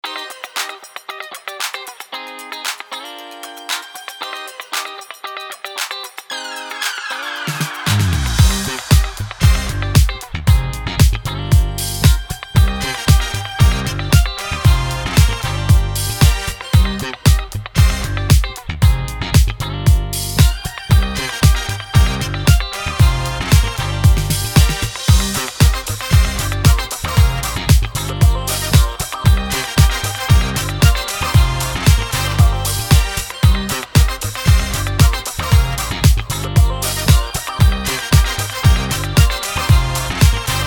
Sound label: disco party; ragemap2025